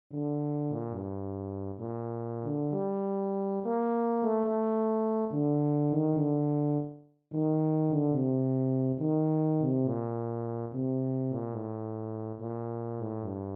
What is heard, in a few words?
anthem; grand mark